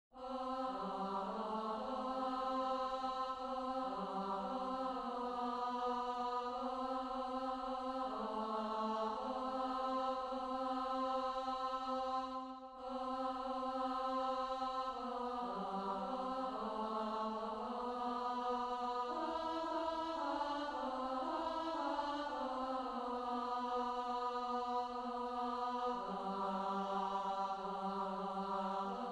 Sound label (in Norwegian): Odalssang Alt